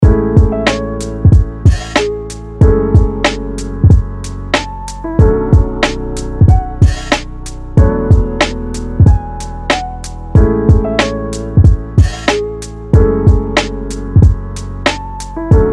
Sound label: Solo Camp